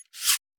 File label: swallow; JavaGameUebungen; player